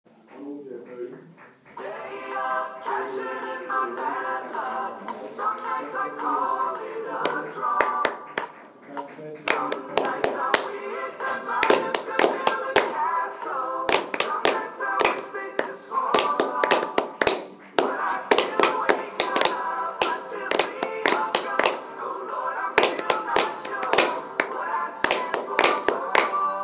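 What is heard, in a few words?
clapping piece syncopation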